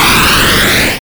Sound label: Dash, SFX